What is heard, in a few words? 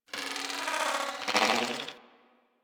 Beep Stutter; Warframe; Ambience; Corpus